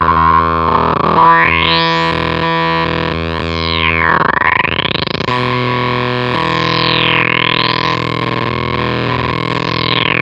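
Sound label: Fuzztest guitar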